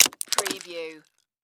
Walnut Crack Open; Sound Effects Bible

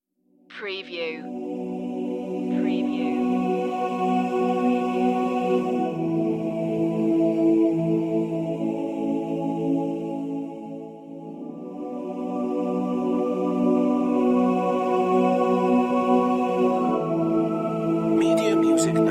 choir, Orchestral Soundtrack Slow Emotive

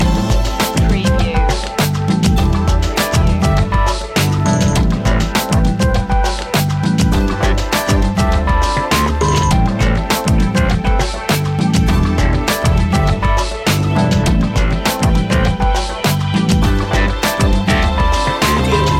loop, funky groovy

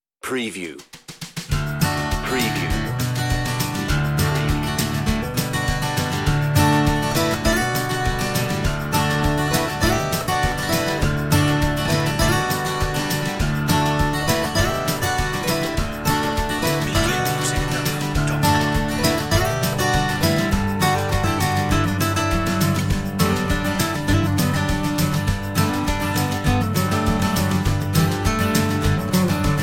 Fields Of Yellow, on-hold music

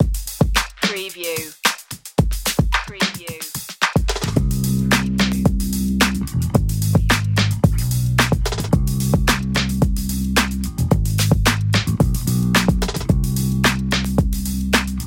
glam style drums and bass, hip hop; urban rnb

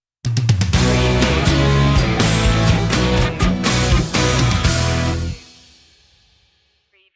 bright tomorrow sting; refined

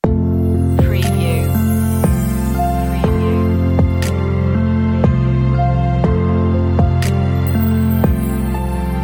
away in a manger euphonium feature loop intro; christmas; traditional

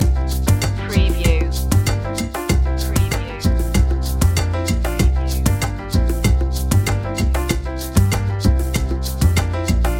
todo bien loop, latin spanish; world influences

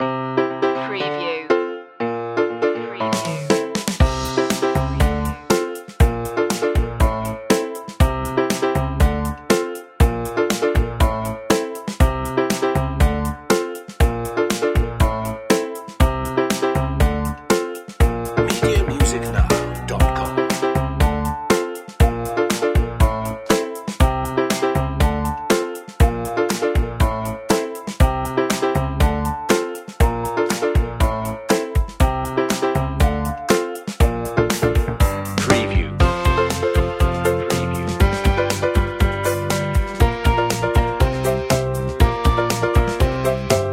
fresh upbeat, funky groovy

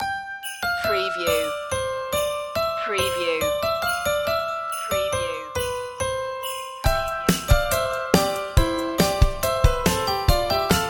on-hold music; Deck The Halls; MediaMusicNow

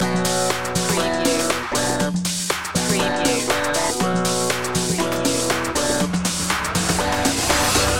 loop, Funky / Groovy

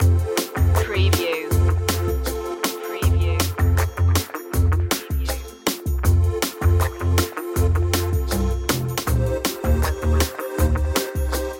upbeat cool loop, funky groovy

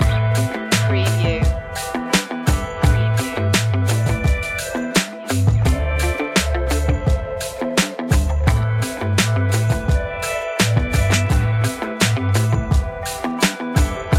urban hip hop loop, trap

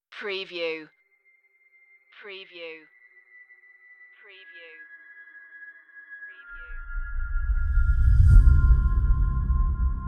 no escape, soundtrack spy mystery

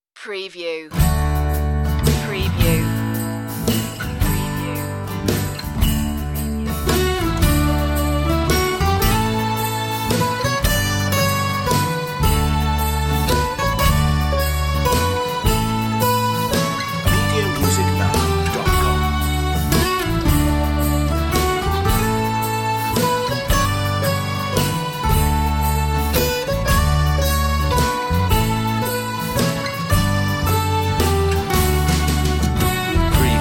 First Noel, on hold music

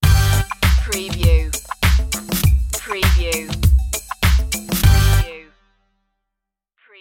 sting, funky groovy

